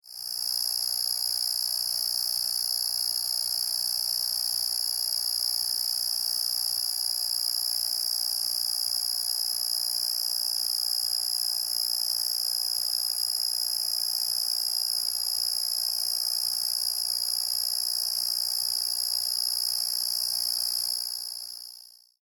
Electric Buzz High, Very high pitched electric buzzing; from high voltage cables; LYN111 package of Electricity; Electrical Sound Effects; LynneMusic